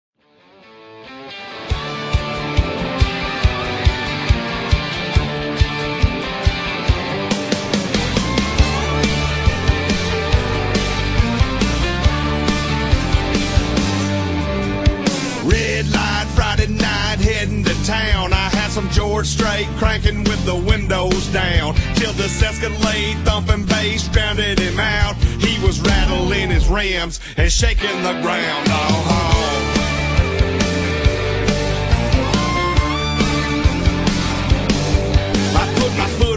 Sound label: hip hop in a honky tonk